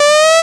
Synths E; vengeance essential club sounds-3

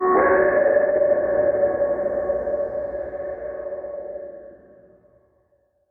VFX Atmos

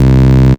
Bass C, Offbeat Bass

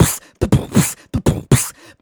Beatbox Loops, Human Beatbox

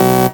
Synth Shots; Vengeance Samples

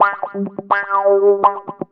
125 g, guitar licks; Vocal House; PS-28 Vocal House Samples; instrumentloops

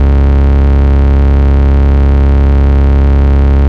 Vengeance Ultimate Bass C#, Root C#; Vengeance Samples

